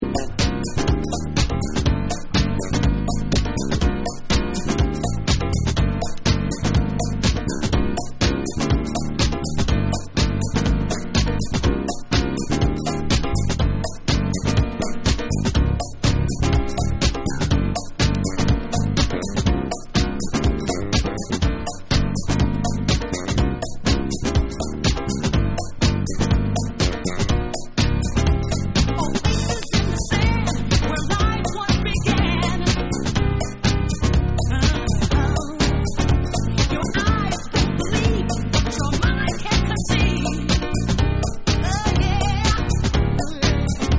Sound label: Groove Dub